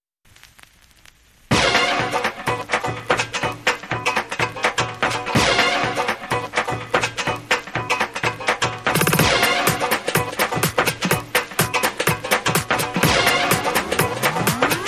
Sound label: Swing Jam, Underscore